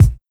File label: short kick drum sample a key; kick drum samples